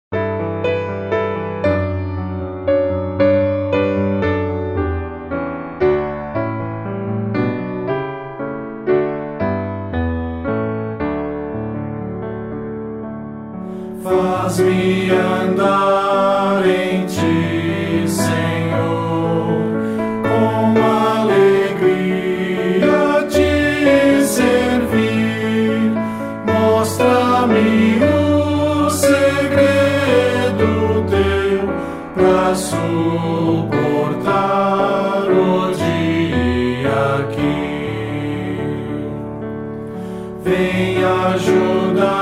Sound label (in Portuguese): voz piano